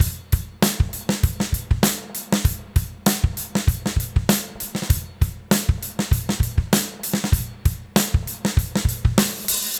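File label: 98 bpm, beat loops; Zero-G; Monster Beats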